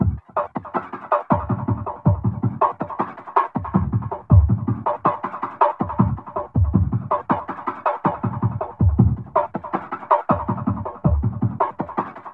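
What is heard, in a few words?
jungle filter; Zero G; Malice In Wonderland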